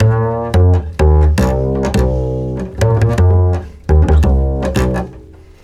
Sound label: Double bass A 170bpm, Bass Loops; Total Drum&Bass